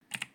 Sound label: key